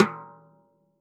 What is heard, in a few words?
stickshot snares off; JazzFunkKit